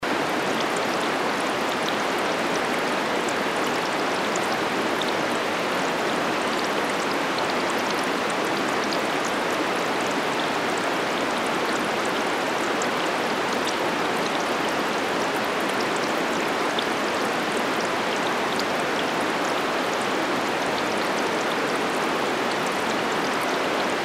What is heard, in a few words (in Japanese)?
川